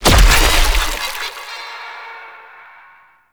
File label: Ink Wall Bounce; Gameplay; Warframe; Shadowgrapher